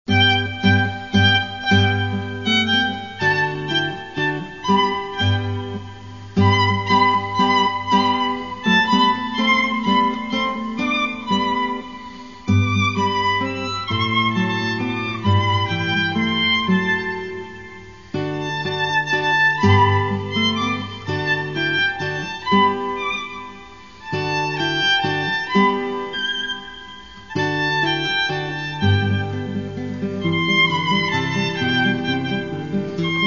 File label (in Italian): cantabile e ritmico